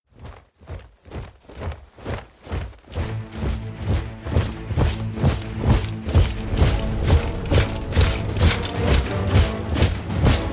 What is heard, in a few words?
Medieval Weapons; BOOM Library